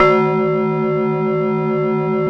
700 T-BELL F#3, Korg; Back In Time Records; Korg Universe VOL-1

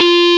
GTR Fuzz, Dirty; Club-50 - Foundations Roland

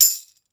Tmbrn, Roland SPD-S

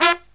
LOW HIT, Brass

